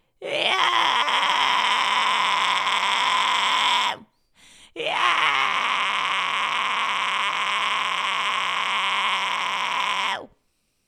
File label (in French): fry scream simple basique; les effets complexes